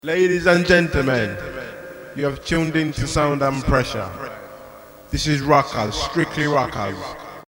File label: vocoder